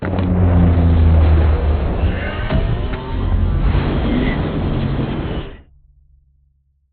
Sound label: strafe close; Beacon; Titanfall 2